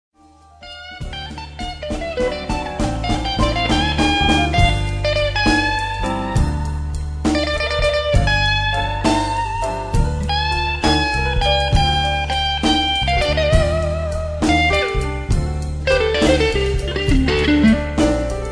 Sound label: Improvisation